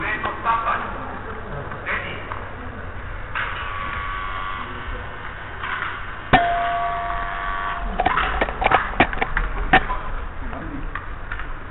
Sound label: single shot